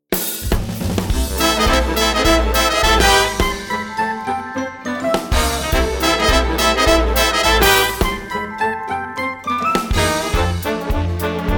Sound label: vocal sparkle jolly twinkle jingley reprise